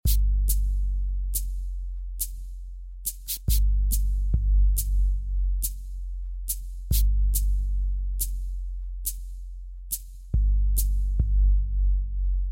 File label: Sneaky steps